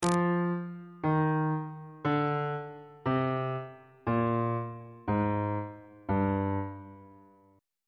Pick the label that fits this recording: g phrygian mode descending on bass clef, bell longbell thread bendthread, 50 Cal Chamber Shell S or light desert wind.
g phrygian mode descending on bass clef